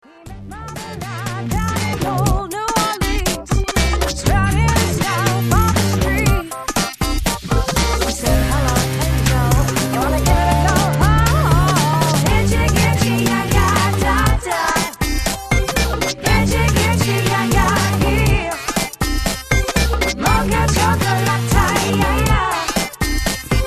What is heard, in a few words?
Bassline